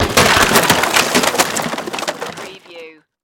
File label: Crash Wood